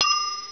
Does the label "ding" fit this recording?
Yes